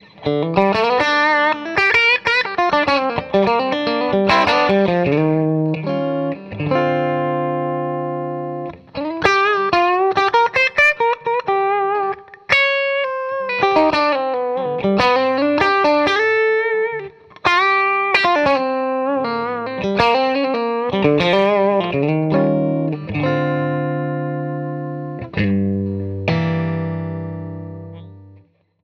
Semi Clean Strat BM pu vol 13 treble 13 middle 12 bass 12 open back cabinet